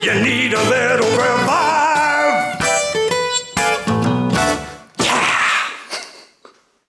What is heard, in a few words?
Revive Sting; Zombie Perksacola